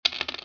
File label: Fly Jetton; Baccarat